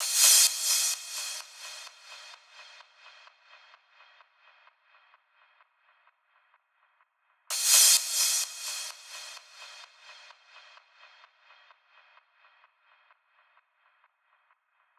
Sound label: Crash loop, Big EDM Drum Loops 128 BPM; Future House; Future House Tools